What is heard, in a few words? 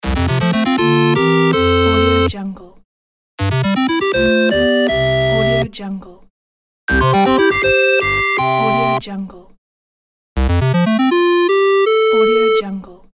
8bit level complete